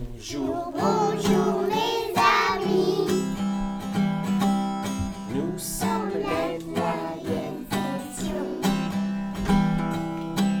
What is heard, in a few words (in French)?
chant rentree; la chanson de la rentrée septembre 2024